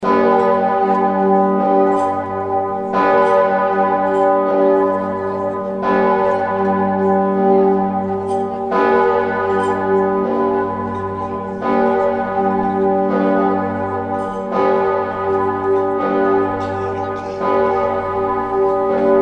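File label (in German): Grosse Glocke Würzburger Dom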